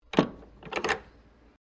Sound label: TELEPHONE WOODEN AUTOMATIC DIALING WALLPHONE PICKUP